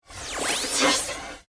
Cosmic Static, Scifi, Cosmic, Static, Science, Fiction; SoundEffects-BlastWave